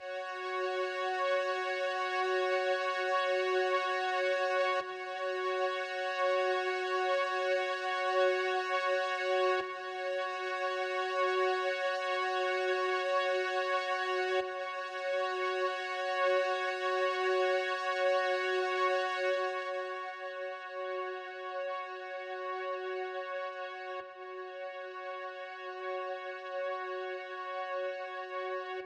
100 BPM D# Min Drone, Pop; Cymatics; GHOST (Beta Pack); Broken Heart

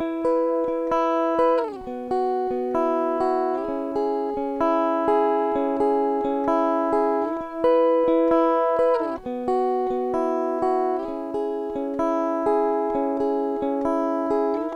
Guitar Loop - 130 BPM E Maj; Instruments; Guitars; Cymatics; Light Free Sample Pack; Bonus Samples

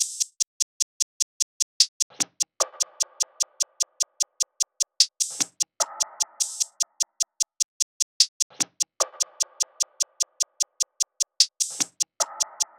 Wishing Well Perc Loop - 150 BPM; Cymatics; Fiji Hihat Loops & Percussion

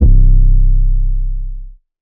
808 C; Advent Calendar - Day 9 - 808s

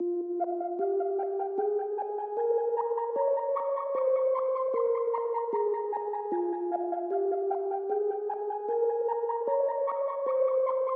152 BPM E Min Synth Melody; Trap; Melody Loops; Loop Stems; Cymatics; Nightmares Trap Melody Loops; Firing Squad

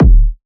Techno, Kick; One Shots; GhostHack; Ghosthack - Free Techno Sounds 2024

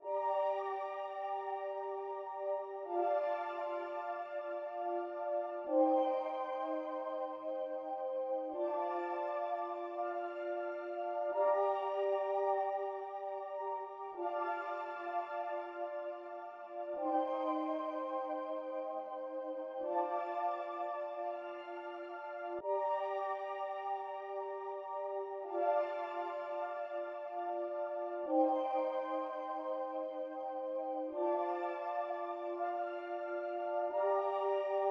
170 BPM G Min Pad; RnB; Loop Stems; Cymatics; All 4 U; 2022 Melody Collection

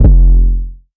808 F; Cymatics; Oracle Sample Pack; 808s

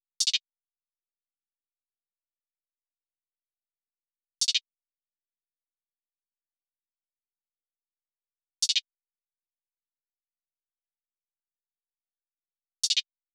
Drum Loop - 114 BPM Hihat; Loop Stems; Cymatics; Vibes II Drum Loop Collection; Toast

